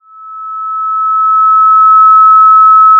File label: Sound of a police car siren